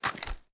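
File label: weapon foley assault rifle xmag reload empty end; gmod; viper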